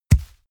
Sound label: horse step grass